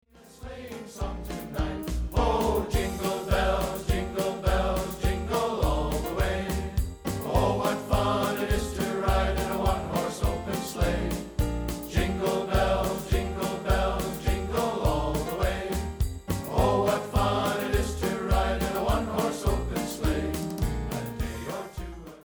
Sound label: jingle bells